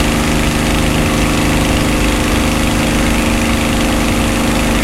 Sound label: spitfire cockpit, engines; War Thunder